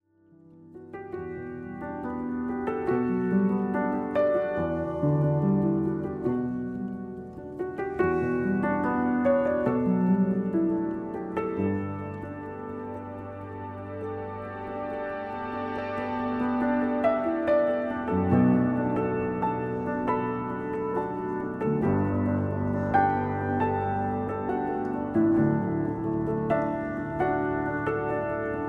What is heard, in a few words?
piano miniatures